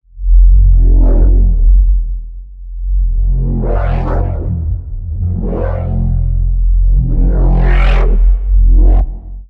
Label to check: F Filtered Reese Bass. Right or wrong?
Right